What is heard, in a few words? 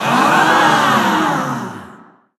aaaaaah; running man; genki